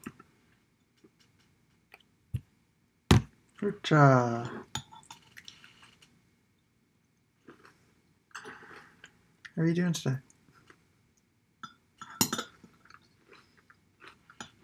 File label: Snare